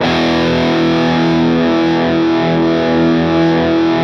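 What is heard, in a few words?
fuzz chord, fuzz guitar vibrato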